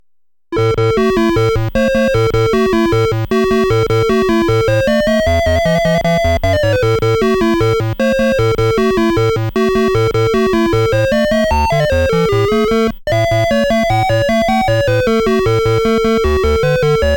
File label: Round Clear~Energy Bonus; Namco Graffiti 1; NEW RALLY-X BGM